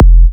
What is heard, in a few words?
Minimal Kick, Drums, Kicks